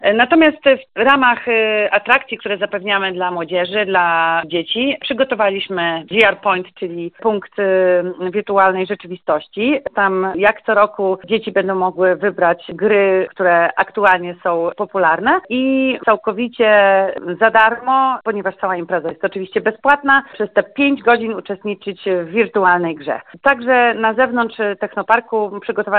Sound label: techno; wariacje z nauka w parku naukowo technologicznym